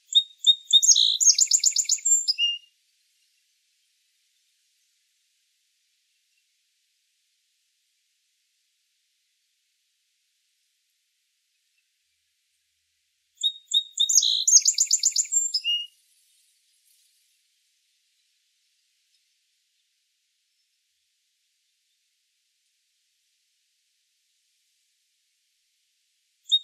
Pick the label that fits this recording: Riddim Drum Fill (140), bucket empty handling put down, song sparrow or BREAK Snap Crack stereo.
song sparrow